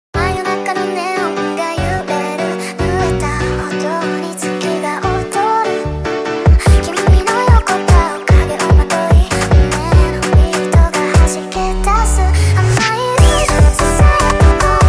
Montagem sped Up

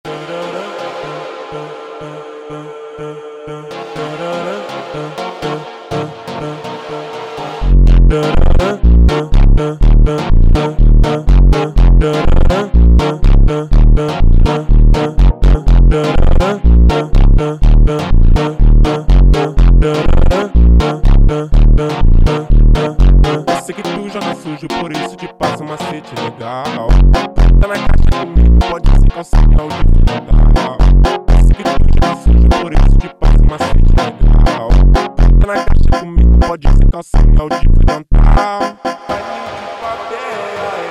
Funk Slowed

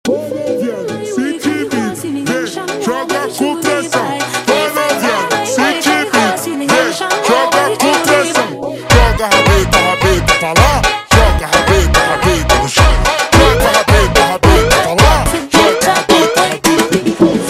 Echoing Funk super Slowed

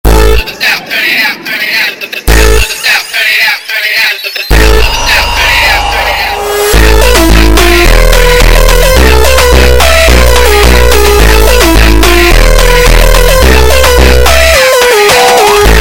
Montagem slowed

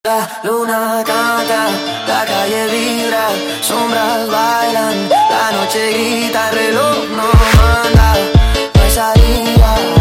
Montagem sped Up

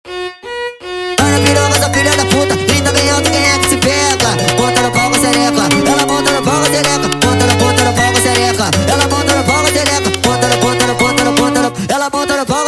Brega Violino sped Up

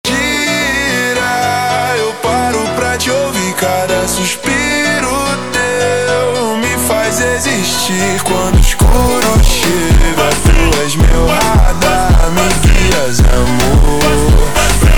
Montagem slowed

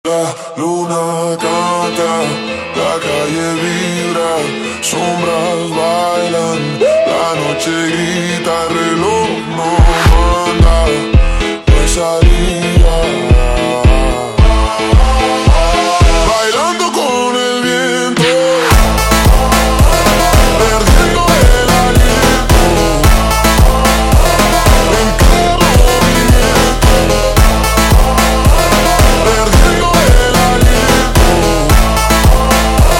Montagem super Slowed

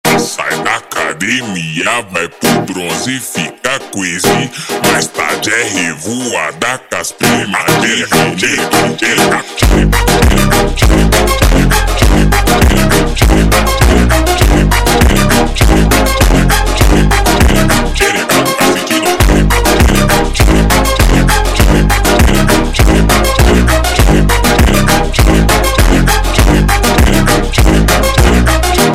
Montagem ultra Slowed

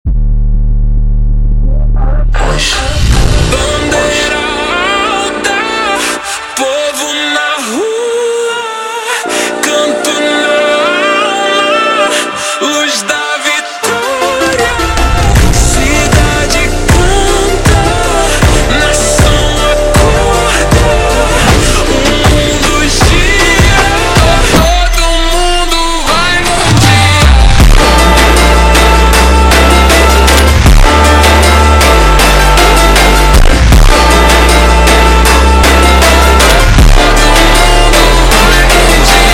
Funk super Slowed super Slowed

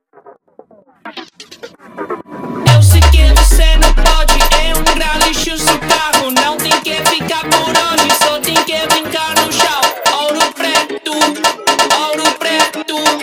funk

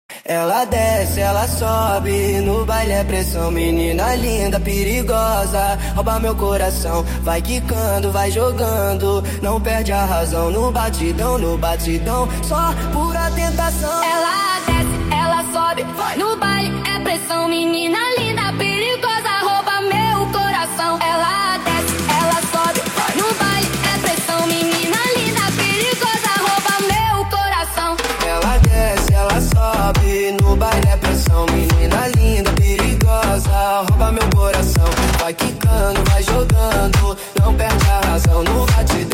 Funk sped Up

